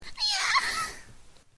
yah ahha

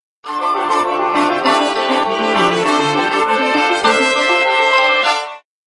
koto

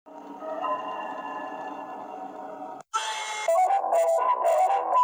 jumpsacre; foxy

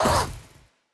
duck death; enemy

